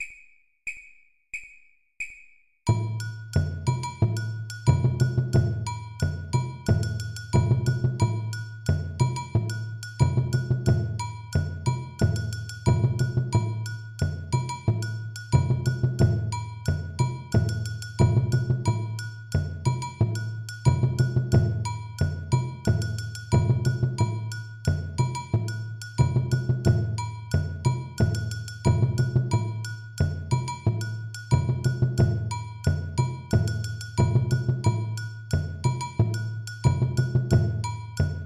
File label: new reggae bells